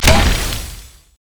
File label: sonicwave; LoL; leesin skin05